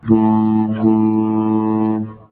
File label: Horn; JQ6500